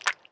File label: Sex Slide; Lewd SFX